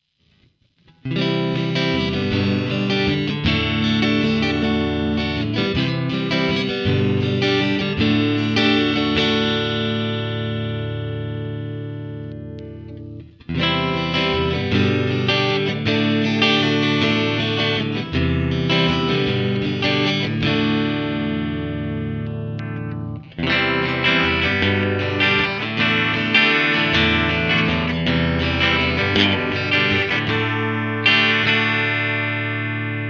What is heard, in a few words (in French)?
clair accords